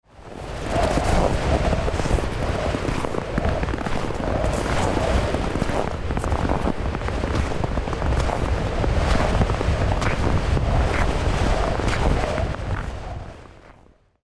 skrzypienie sniegu; kalendarz pogody

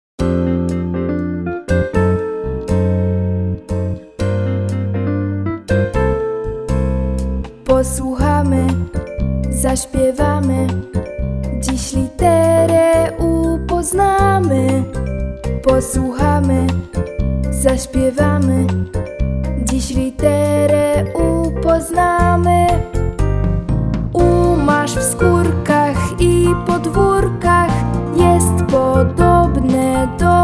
piosenka litera oo; szkola marzen na tak; klasa 1

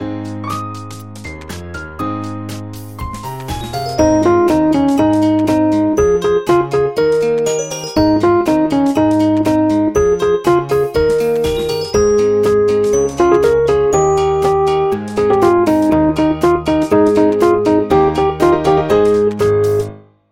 plas bez wokalu

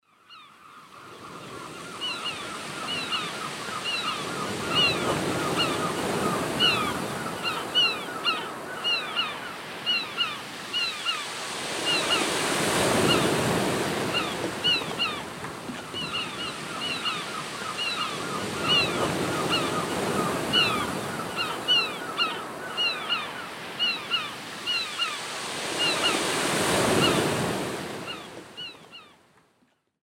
nad morzem; karty obrazkowe; mala i duza ojczyzna

